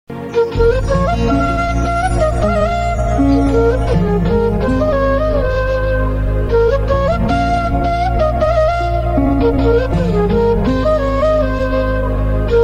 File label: Flute Ringtone